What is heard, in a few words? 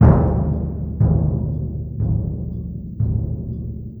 Bass Drum, Orchestral Series Rock and Pop; Sonic Foundry (Sony Creative Software); Orchestral Series VOL-4 - Rock and Pop